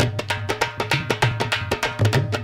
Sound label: Dhol; loops